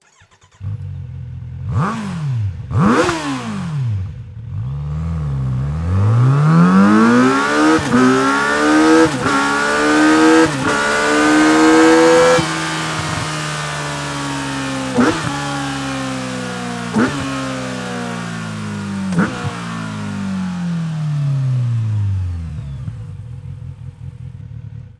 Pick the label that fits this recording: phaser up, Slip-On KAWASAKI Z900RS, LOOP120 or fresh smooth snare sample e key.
Slip-On KAWASAKI Z900RS